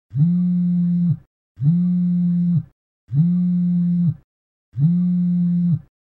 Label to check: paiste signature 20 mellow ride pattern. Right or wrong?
Wrong